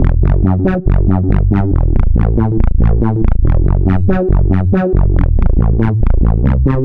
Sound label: Bass Line; Eclectic Electronica